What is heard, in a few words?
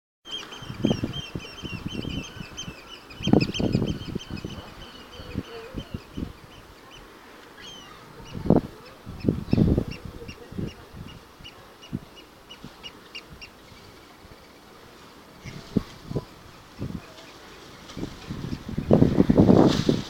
oystercatchers calling at conwy castle wales; sounds of our shores